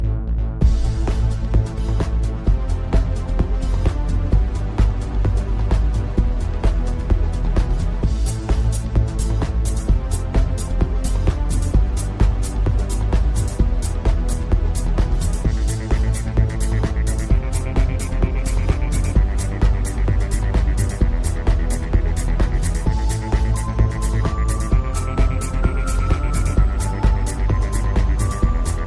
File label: Instrumental